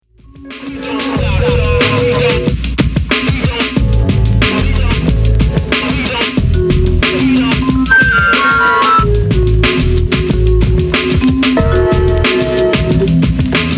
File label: beat; beat journey